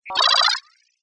science fiction robotic cyberpunk electronic equipment beep glitch; zapsplat